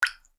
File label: Water open; Nokia; Audio themes